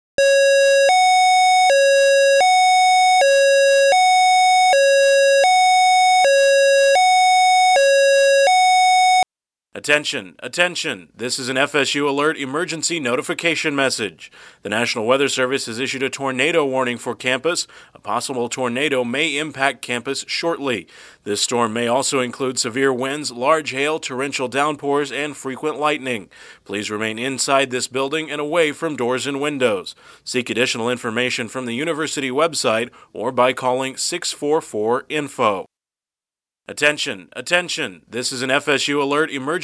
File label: Tornado Indoor